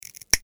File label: switch